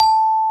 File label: xylo A